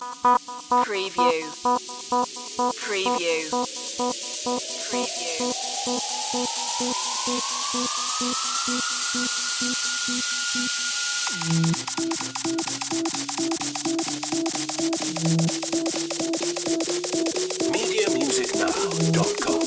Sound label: club dutch